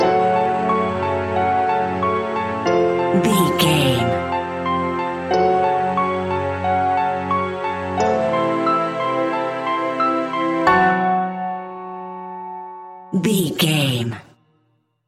modern horror; Video Game

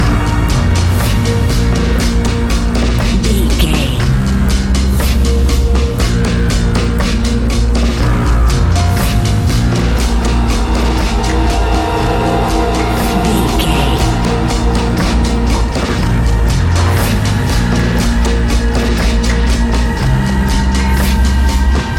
suspenseful nightfall

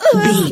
voice female hurt pain mild

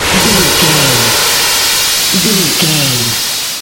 retro spaceship engine

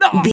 voice male hurt pain

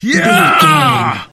voice male battle shout

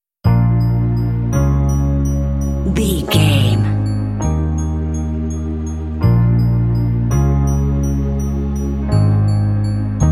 sexy lounge spy cinema

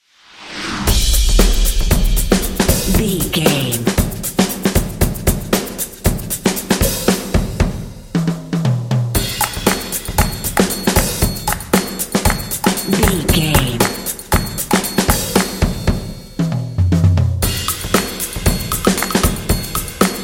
hip funky drums claps percussion ad beat

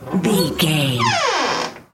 door old wooden squeak

